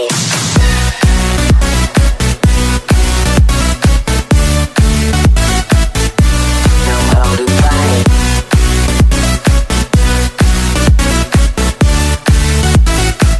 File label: Electro Bootleg